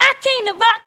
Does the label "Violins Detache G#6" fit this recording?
No